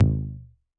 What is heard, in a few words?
ANALOGUE TOM LOW; drum machines